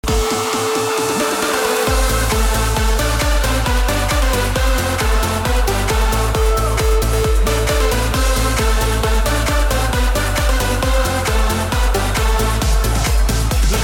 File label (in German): Tormusik